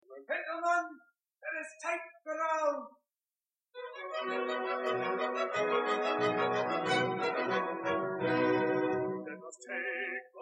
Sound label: air